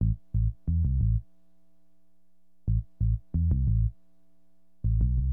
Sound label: BASS, HipHop; LiveAct Pool